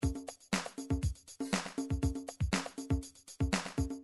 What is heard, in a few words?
drums new age, midi drums